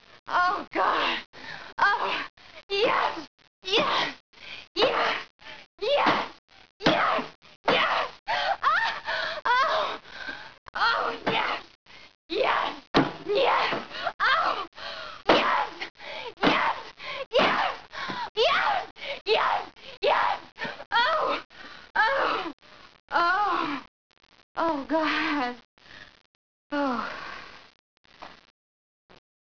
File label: orgasm